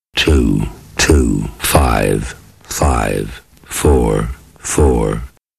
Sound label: Jingles